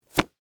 Track Pants Elastic, Foley, Clothes, Track, Pants, Snap, Elastic, Waist; Dressing; BlastWave